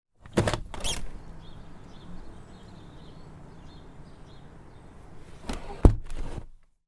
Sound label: window open upvc birds distant traffic close, household